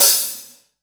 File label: HH, EMU Orbit-3; 300 Drum Machines